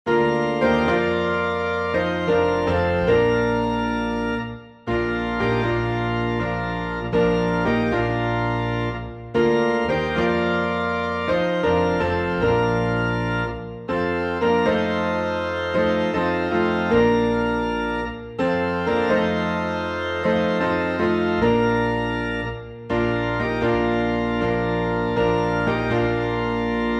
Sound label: Piano and Organ in B Flat; hymn; Rock of Ages; Hymnal 1982